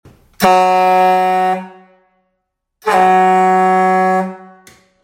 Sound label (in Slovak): fanfara 640mm chromova hlboky ton bez kompresora